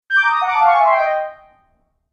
Cartoon Failure Horn; Cartoon Music Sound Effects

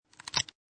Foley Plastic Lid Close; Sound Effects:Materials

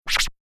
Turntable Scratching; DJ (Sound Effects:Musical)

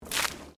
Water Splash Concrete Small

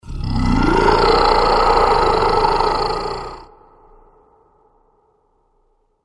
Dragon Of Doom, Monster Sound Effects; fantasy

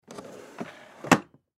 Drawer Dresser, Drawer Dresser Close; Foley; Household; Blastwave

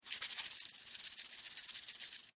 Food Seasoning Bottle Shake Long; Food Sound Effects; Food (Sound Effects:Household); SFX Bible 6

